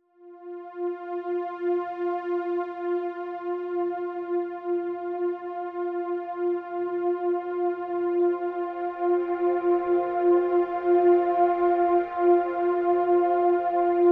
PADS Vapors (F)

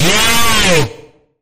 Sharp Bend, A sharp; Sharp Bend Ahead

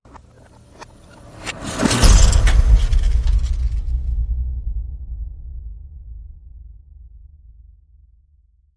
Film Projector Whoosh Boom

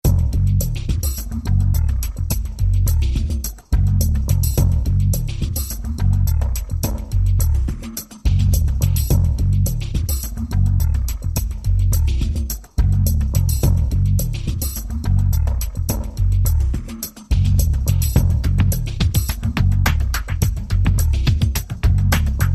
Groove Run

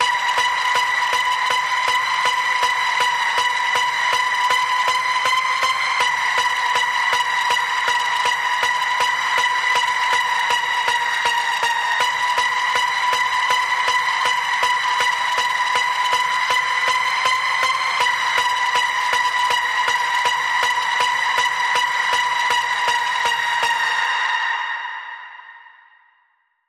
Paranormal Panning Pulse B, Key of B

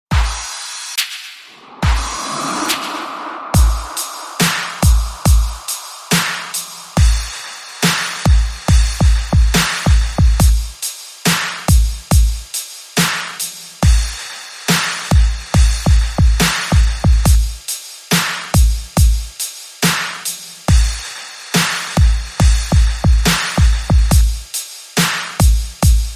Vector Beat

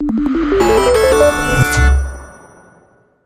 Sonic Space Ring

